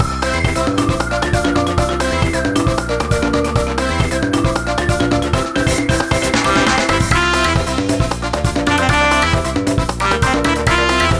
BGM; wicked monsters blast hd; wii u

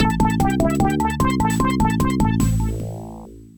Jingle Caught; neighbours from hell smg4 mod hardcore reload

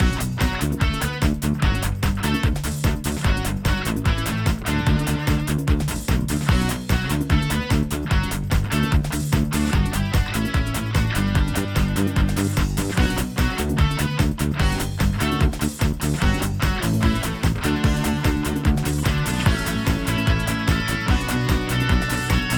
music park main loop; Horrid Henry's Krazy Karts; Switch Windows

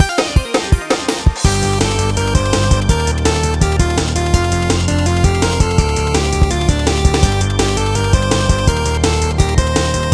Superhero violin; Bomb

